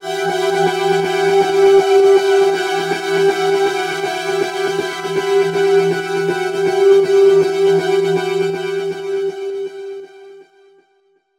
Pad G 120bpm

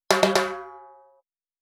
Timbales

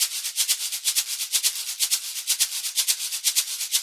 Shaker Loop

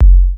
Kick

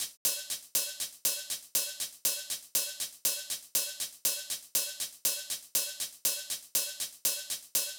hihat

